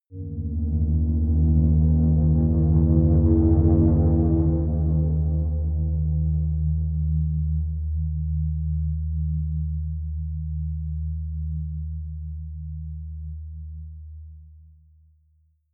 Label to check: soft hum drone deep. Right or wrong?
Right